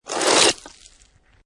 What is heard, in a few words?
Snow And Ice Ice Or Snow Scrape With Debris; Ice Sound Effects; Ice (Sound Effects:Weather)